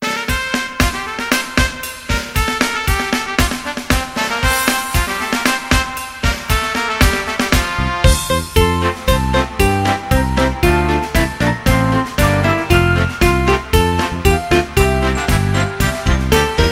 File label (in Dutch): instrumentaal; Communieliedjes